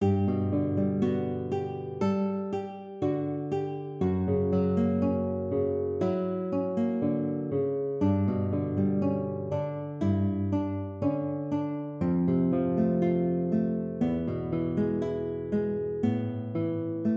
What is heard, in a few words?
Whispering guitar